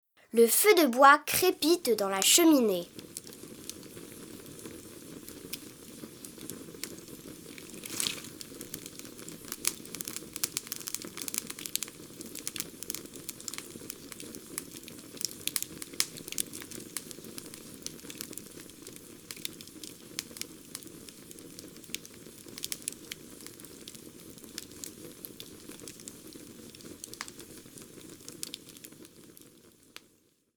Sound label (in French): Le feu de bois; Mon imagier sonore de la nature